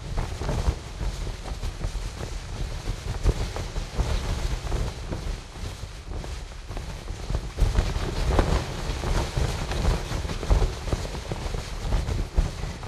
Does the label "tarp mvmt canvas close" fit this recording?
Yes